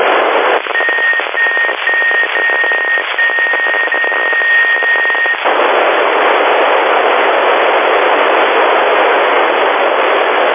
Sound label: khz nfm